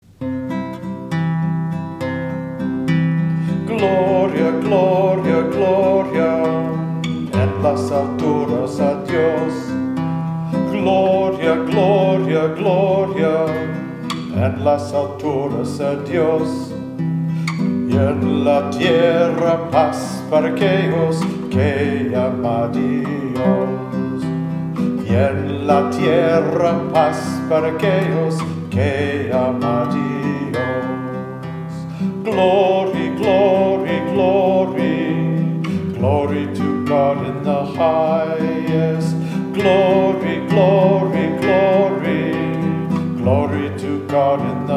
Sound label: Gloria Espanol